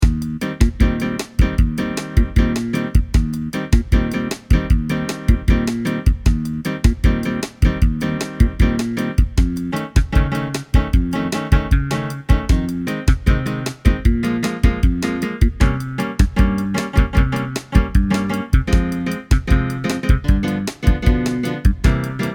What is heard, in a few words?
sax